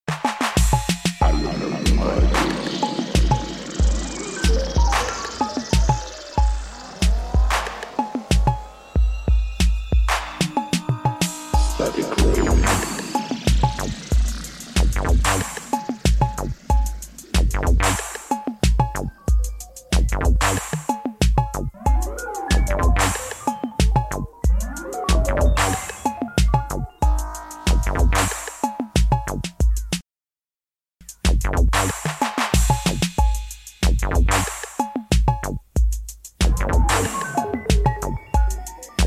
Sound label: Static Rain